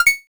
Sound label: sfx coin pickup; arrgh-hoops